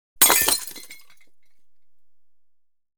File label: Glass, Bag Of Bottles Break; BBC Sound Effects Library; Explosions Guns Alarms